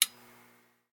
Lamp Off Camera; Game rip; MiSide

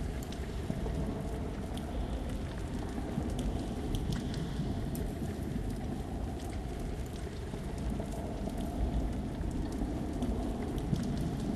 Ambient; MiSide

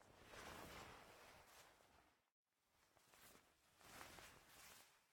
Player Give Cup; MiSide

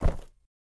Box Hit; MiSide

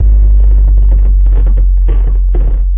Illusion Corridor; Game rip; MiSide

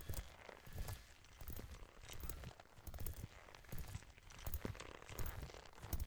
Maneken Walk; MiSide

